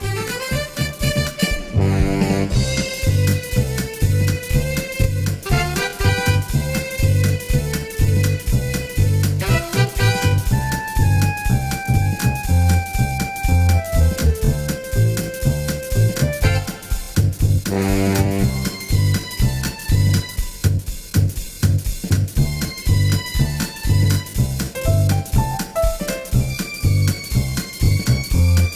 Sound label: audition accompaniment